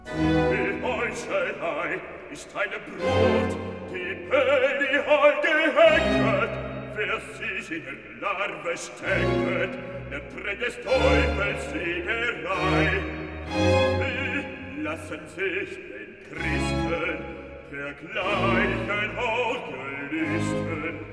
Recitativo Bass; Die Heichelei ist eine Brut; Bach; Cantatas; BWV 24; Ein ungefarbt Gemute

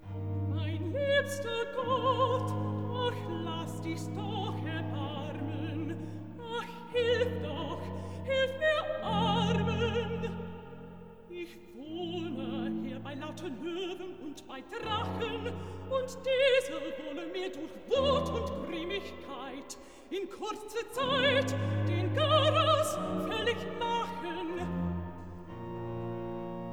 Recitativo Alt; Mein liebster Gott, ach lass dich's doch erbarmen; Bach; cantatas; Schau, lieber Gott, wie meine Feind

